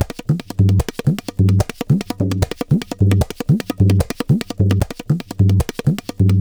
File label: tabla, 150 bpm; Zero-G; Pure Tabla